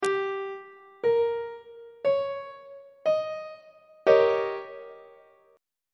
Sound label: e flat dominant 7th 1st inversion; on treble clef